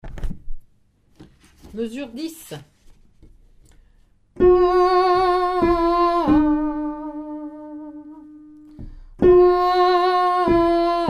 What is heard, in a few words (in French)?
alto, chorale